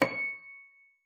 FLAG C7, harp; Xsample VOL-8; HARP+FX

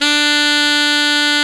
D3, REED; AKAI S-Series CD-ROM Sound Library VOL-8

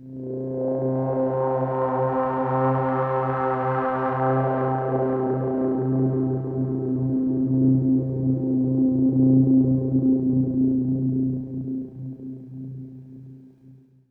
Pad C, Synth Prophet One Shots; Electronisounds

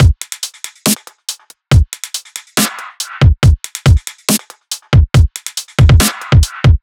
140 drum loops; Skrumdiddly; M8; Electronisounds

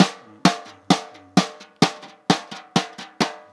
PERC, Balkanish Traditions

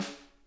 TAP, SNARE; Sonic Reality; Interactive Drum Kits

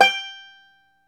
FLATTOP, Flat Top Banjo

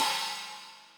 crash, Millenium MPS-400

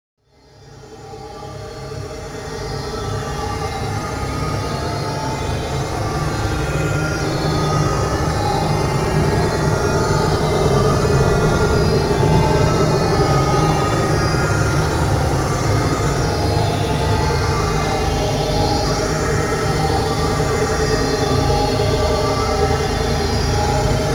Noise Waves; Bizarre Planet

